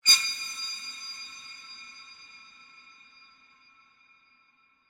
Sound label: sound design horror metal stab